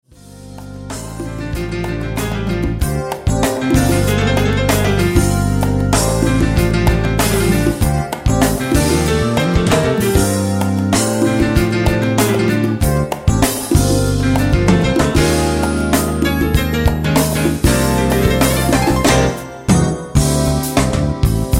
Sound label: funk latino